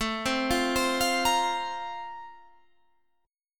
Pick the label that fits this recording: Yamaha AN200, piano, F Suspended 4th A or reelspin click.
F Suspended 4th A